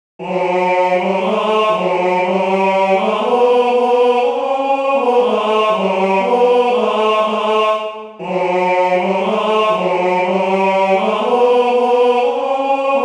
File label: tenor